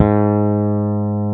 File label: FRE BASS G2